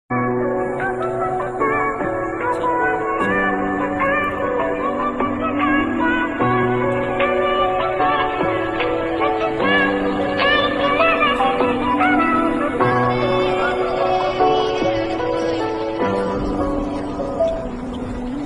bgm ringtones english caller tune english song ringtone